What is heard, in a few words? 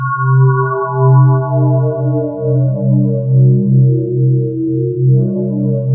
BELL STEE, ATMOSPHERES; Sound Gallery - Charged